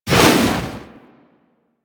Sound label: Burst; Warframe; Purgatory Warrior